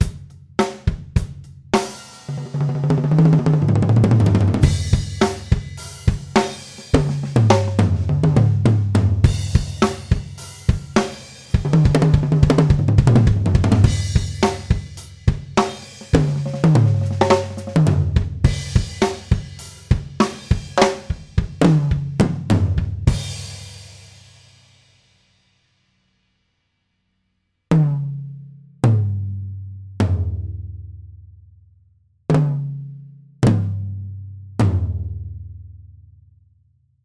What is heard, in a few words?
Toms, drum; drum shootout